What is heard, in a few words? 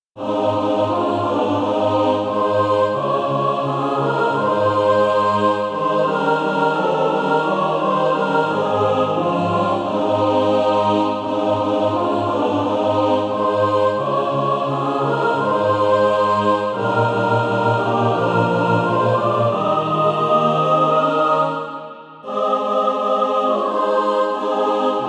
mixed